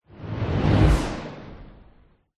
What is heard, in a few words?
sweep transition fast whoosh airy; sound design; zapsplat